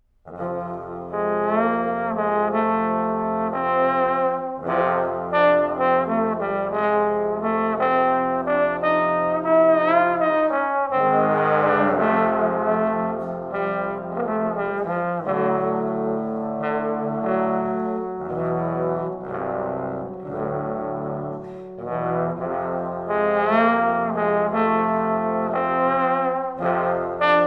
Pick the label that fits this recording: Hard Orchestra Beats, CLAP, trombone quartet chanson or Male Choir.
trombone quartet chanson